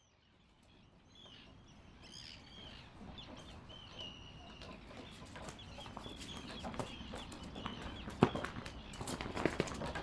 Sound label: flag in wind